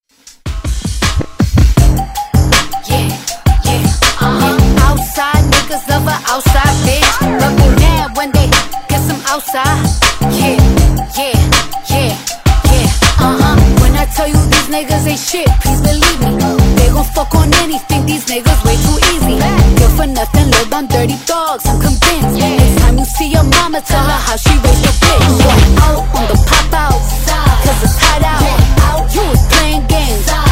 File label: Hiphop Redrum